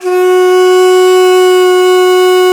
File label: DIZI, Old World Instruments, Winds; E-MU Producer Series Vol. 7 – Old World Instruments